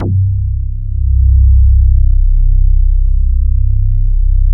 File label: MKS BASS; AKAI S6000 CD-ROM - Ultimate